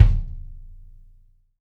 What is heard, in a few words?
Pearl 22 Kick; BLACK PEARL